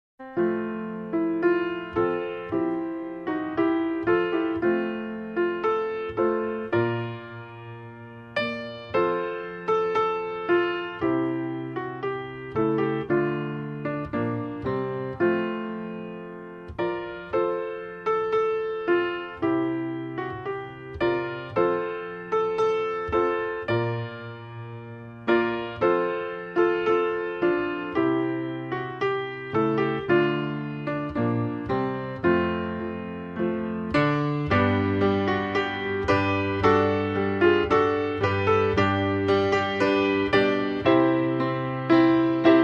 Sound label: Auld Lang Syne, Solo Piano